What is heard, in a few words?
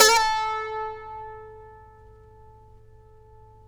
SAZ A 4; Spectrasonics; Hans Zimmer Guitars VOL-2